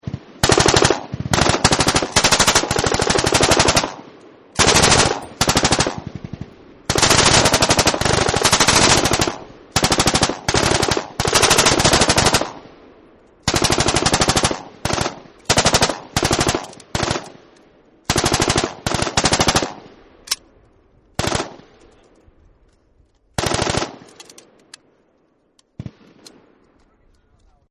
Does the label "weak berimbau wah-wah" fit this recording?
No